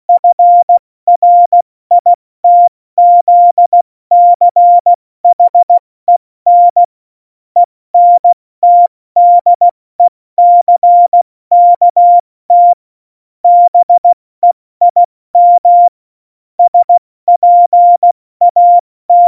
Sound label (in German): Witz, Morsekurs, 70 F80